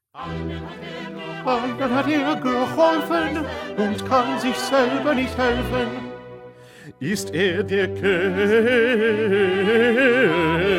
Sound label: 1st Bass